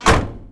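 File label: door close; Mafie 1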